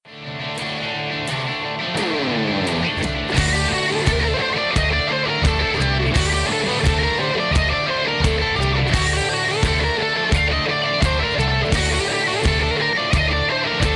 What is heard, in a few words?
Hard Rock